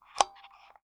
Taxi Meter

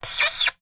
Polystyrene Squeak

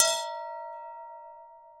Strike, Vase

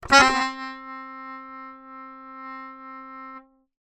Ornament, Melodica

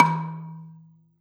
Gambang Hard Beater, Gamelan

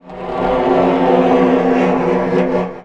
Snare Drum; Percussion Samples

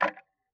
Tick, Clocks Kitchen Clock

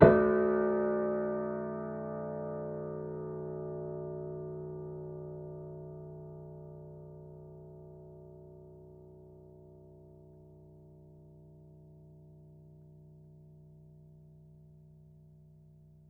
Piano Harmonics, Harm Low Mid

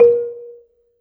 Gambang Soft Beater, Gamelan

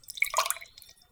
Dribble, Water Dribble

